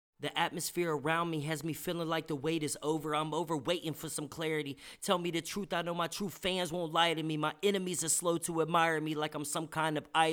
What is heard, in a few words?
acapella, HH 120 Em